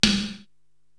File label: TOM Hi Ambi, Korg TR-Rack